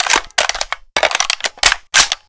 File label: reload; minecraft